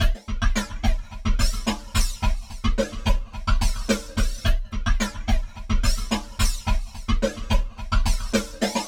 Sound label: Drum Loops Processed; Zero-G Smoke